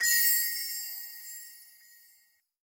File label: Start Animations Star